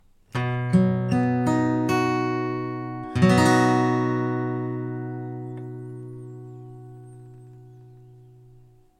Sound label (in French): Si majeur, guitare